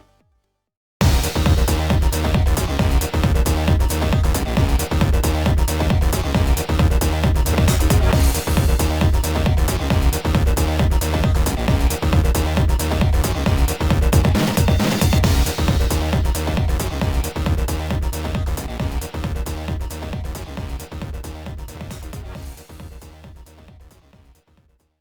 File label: Duel Music; YuGiOh Over the Nexus